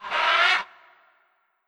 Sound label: Ara Parrot; Ara Parrot V O A2